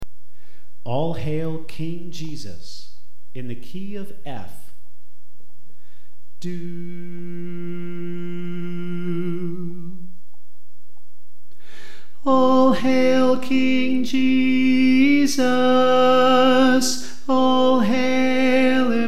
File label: Soprano